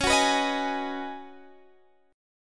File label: C sharp 7th Suspended 2nd Suspended 4th, C sharp, down, Guitar, Standard; strummed; chord